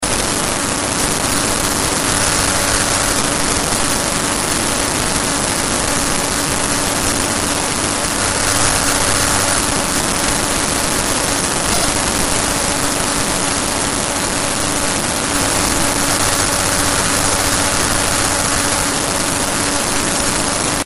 plc adapter

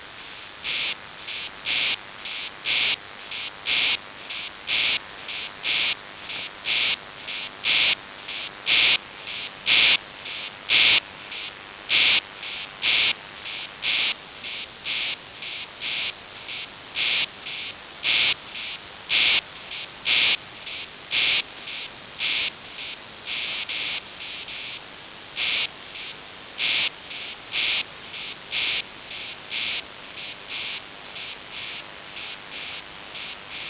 9090khz cf sr4800 8psk preamble arq system

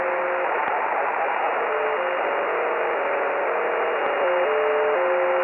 11722 dual tone

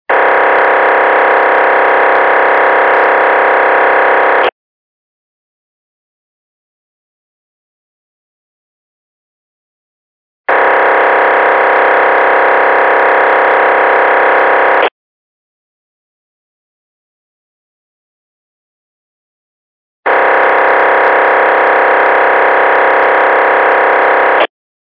NFM

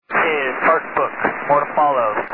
aircraft

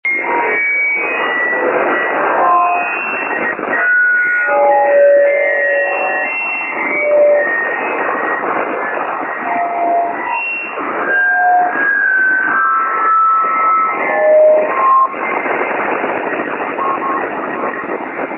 link11; whale

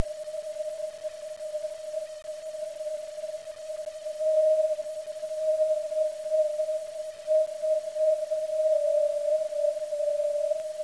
vox

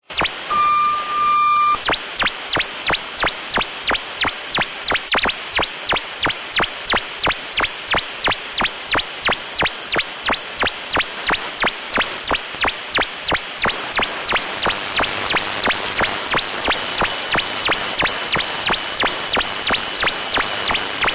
17306 khz usb

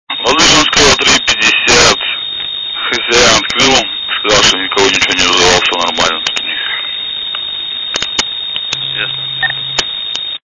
kod descrambled

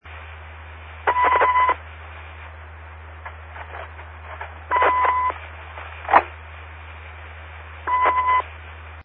signal

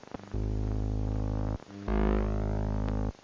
signal AM

